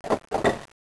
water Battery; NETSTORM

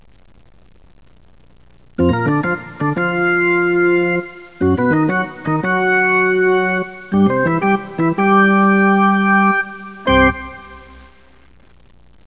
ORGAN; Know Your Hockey: The Greatest Player Resource

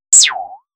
birdy, Synth

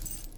foley cloth short; Earth and Beyond; EA Games

